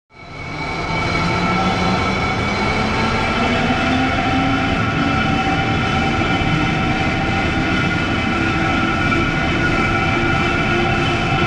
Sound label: Overhead Crane Up, cranes